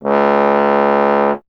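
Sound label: bass trombone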